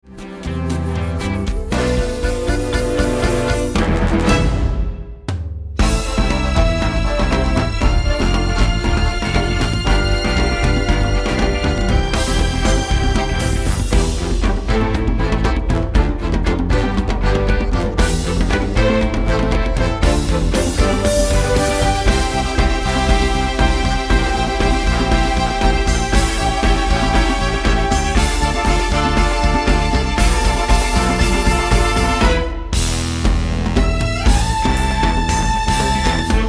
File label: Accompaniment; Shenzi, Banzai, Ed